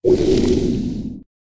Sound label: Water Spirit A mid